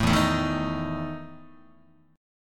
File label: E Major 7th Suspended 4th E down Guitar; strummed; JGuitar